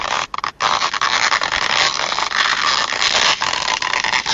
stretching rubber

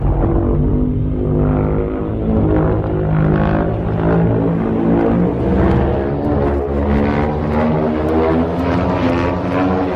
godzilla charging

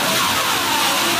jumpscare; rooms low detailed

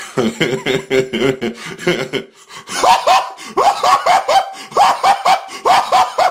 crazy laugh

